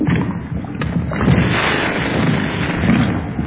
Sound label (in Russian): lava shumnii blizkii aktivnii